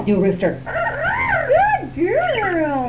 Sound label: rooster